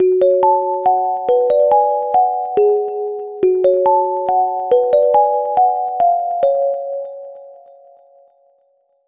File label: Fm 140bpm; Riches